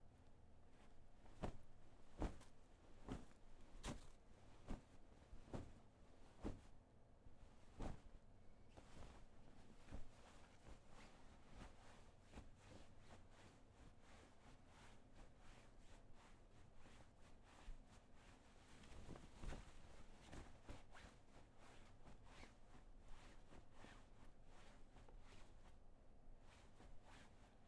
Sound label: Clothes Rustling